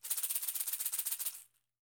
HDS ROL A, Percussion; Sampleheads; NYC Percussionworks; GJ PERC VOL2

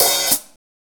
HH 1O3 L, DRUMKIT

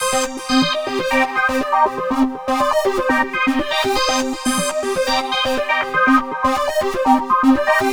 NRG Arp, trance

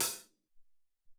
Rm Hat Md, Alesis SR-18

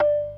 PERC G 2; B3 FULL

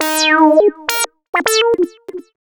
lead (brass); Sonic Foundry (Sony Creative Software); Ugly Remnants VOL-2; Leads

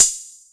TR606 Hat, Roland Tr-606

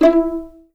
E4 PIZ MF, string; East West; The Ultimate String Collection

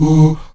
AHOO D#3, STACC. OOS; Spectrasonics; Heart of Africa 1

